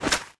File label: draw empty; cstrike; weapons; guillotineex